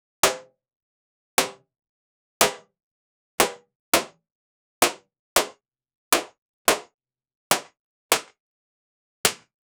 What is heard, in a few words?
balloon splat